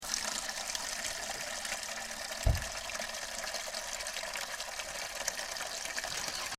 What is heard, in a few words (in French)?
eau source